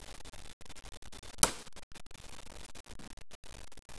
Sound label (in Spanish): Golpe